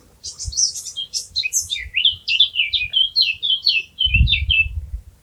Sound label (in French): fauvette a tete noire; Trille de type Itération rapide Glissando Gazouillis vaRié; chant; Registre Aigu Phrase à x Syllabes en nombre multiple Trille